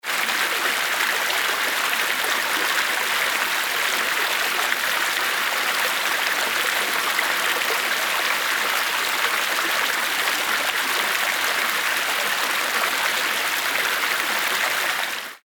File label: water flowing